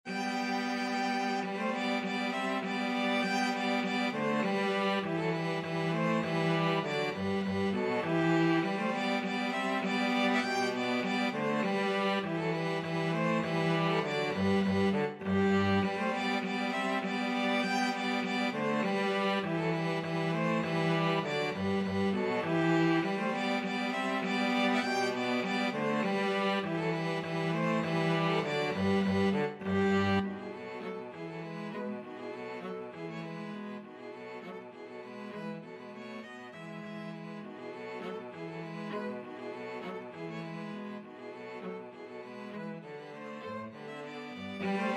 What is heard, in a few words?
slavonic trio